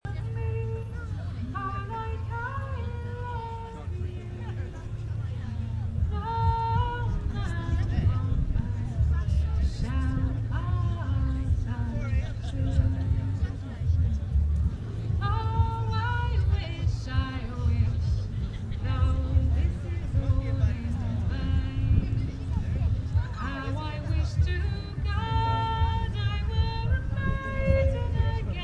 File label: ambient picnic; guildford